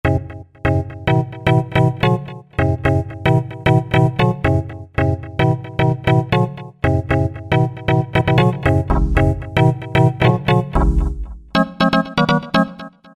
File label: Garage Chord; Roland SR-JV80-11 Techno Collection